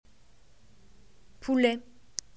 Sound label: chicken